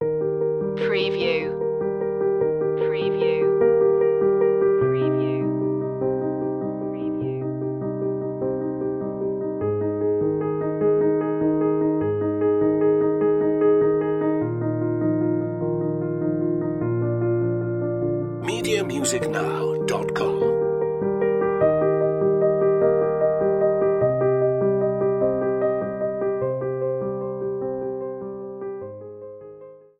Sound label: intimate piano